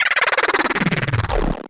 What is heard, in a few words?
Industrial 140